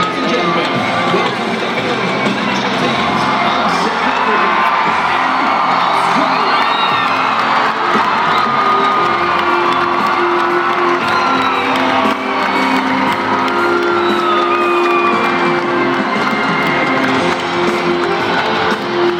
Crowd cheers out the; Socceroos; ANZ Stadium; AUS v RSA

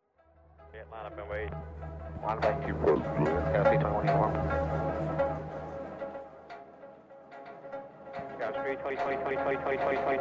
incheon airport remixed sound

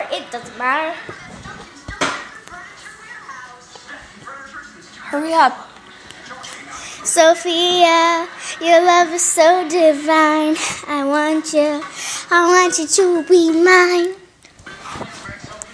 my little sister singing

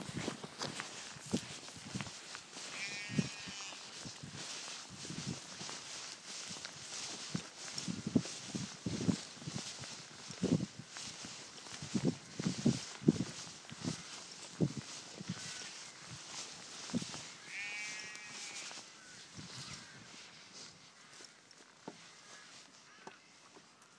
walking with sheep

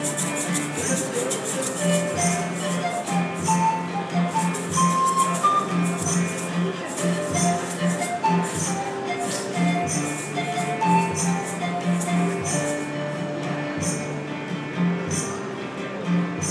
old town music

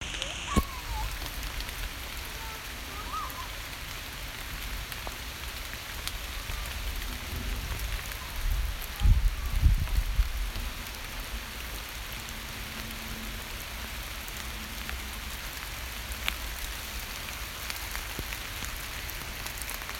ambient sounds in a rainy woods

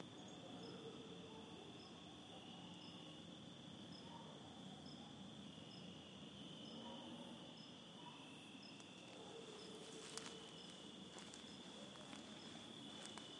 night sounds

